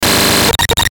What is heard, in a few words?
Glitch Hard Static Stutter; glitches; Blastwave